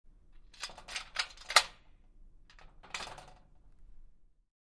Door Accordion Sliding Metal; Lock Open With Key; Metal Gate

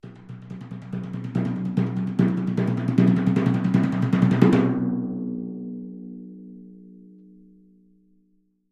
Timpani Hands Thin, mallets timpani

